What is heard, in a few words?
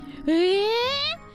uuueeeehh